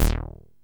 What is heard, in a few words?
TEISCO, BASSES